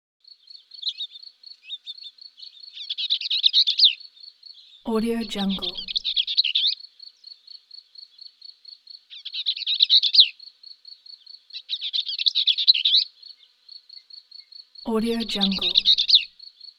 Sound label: bird vireo bells chirps